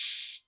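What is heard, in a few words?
Hat Open, Novation Drumstation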